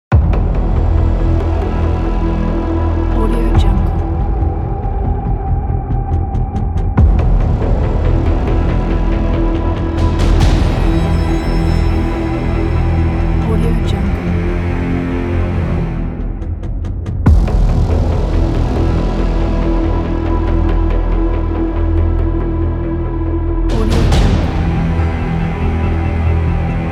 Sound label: dark trailer; goodideaproduction